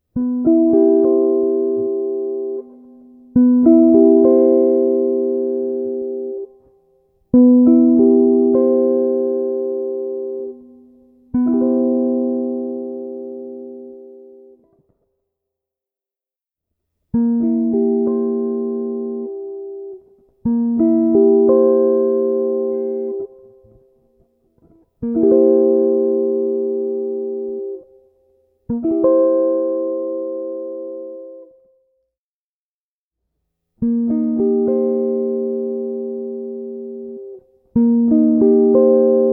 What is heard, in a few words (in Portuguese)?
Campo Harmonico Maior; Jazz Harmonia